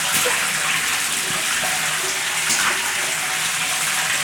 Ice Cave, On The Glacier

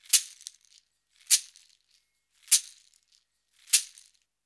Modern Percussion Loops; Soundscan vol.56; AKAI

